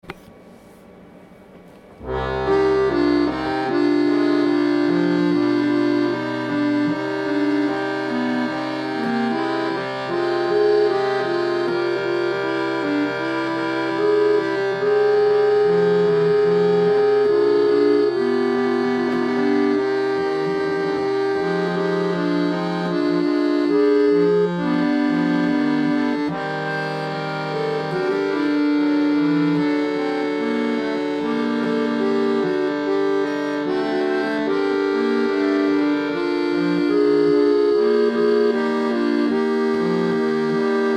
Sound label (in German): ORGANA praeludium C moll; FISCHER